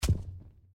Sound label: Abbey Wall; Blind Quest - The Frost Demon